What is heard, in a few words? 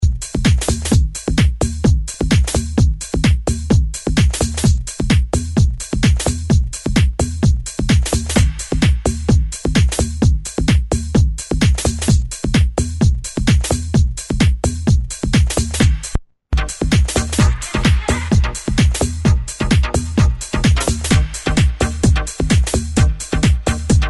Retroactive Funk